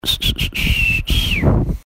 silbido

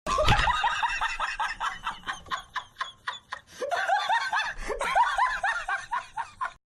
risa del ded

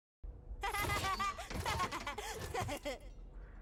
risa

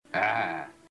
risa

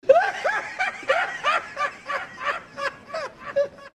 risa

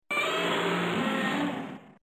godzilla grito

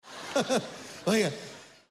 risas; Álvaro Salas oiga

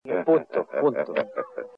risa; punto punto profesor rossa

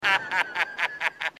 risa; Robin Williams

